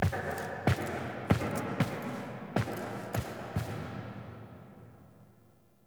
Nursery Frame Foley Enter; The War Within

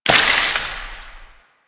Void Hit; Warframe

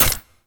Jet Turbine Pistol Mech; Warframe; Tenno

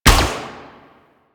Minigun Shot

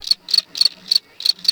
Forest Animal Close; Ambience

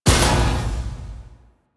Railjack Weapon Fire Stop; Modular Weapons

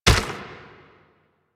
Proto Infested Runner Step Default

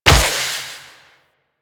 Wall Run Step; Wraith Reaper; Warframe

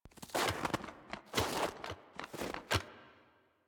Foley Turn; The War Within; Teshin

